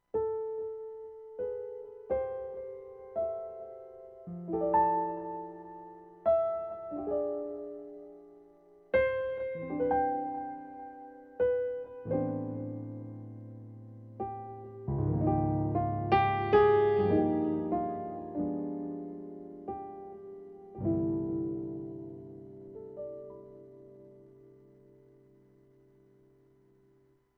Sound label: yamaha tyros 5 voices ambient piano